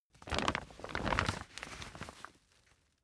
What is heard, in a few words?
camping chair pack up